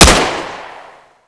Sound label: famas